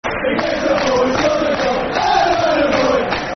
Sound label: Football Chant (Soccer Song); Adam Boyd; Leyton Orient Lofc